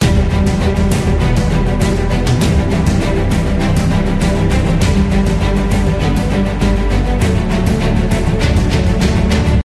Epic; orlamusic

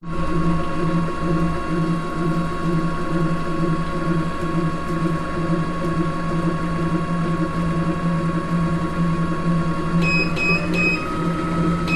sport gym vibration machine running speed up off

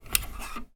household heating thermostat dial turn

